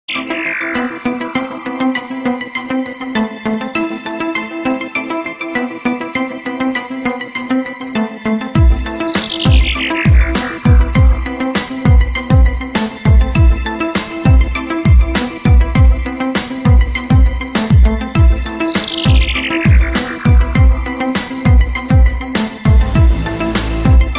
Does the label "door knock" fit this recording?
No